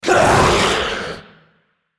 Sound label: Dragon spell cast effort; Shyvana